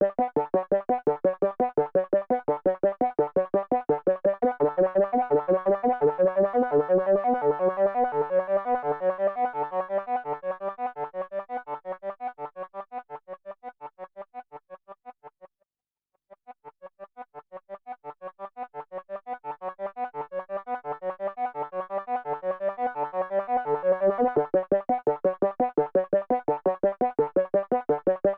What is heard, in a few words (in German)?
Behringer K2 Sequenz Attack Sweep